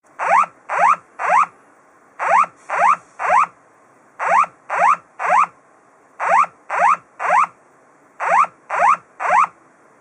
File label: Earthquake early warning AU